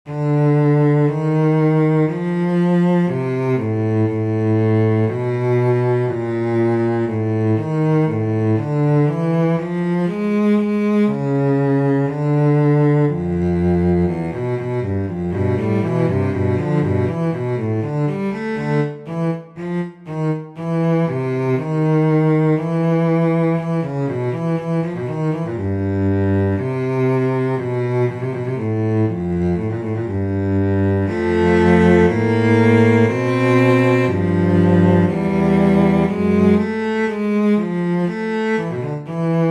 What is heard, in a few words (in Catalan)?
violoncel